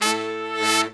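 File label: brass shorts, Brass Sections, Horns; R&R Sect; Roland LCDP06 Brass Sections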